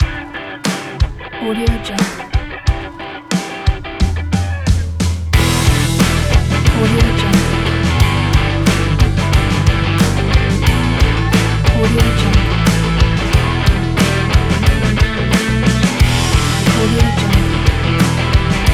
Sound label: indie rock